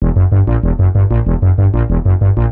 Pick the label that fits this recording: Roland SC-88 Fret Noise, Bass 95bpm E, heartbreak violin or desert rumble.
Bass 95bpm E